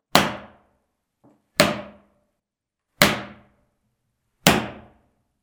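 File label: whipping sound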